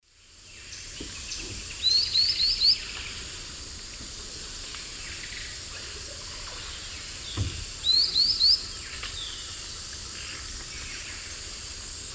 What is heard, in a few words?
Ornithion inerme, song; S. America; Eastern Lowlands